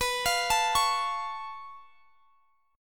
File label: C sharp Augmented 7th B, chord